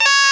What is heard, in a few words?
ScotBagpipes C#3; NorthStar - Global Instruments VOL-1